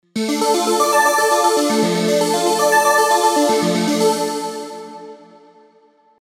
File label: Play Chords; Spire